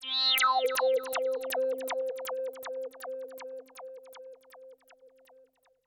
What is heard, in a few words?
AMBNT 160; eJay; Ambient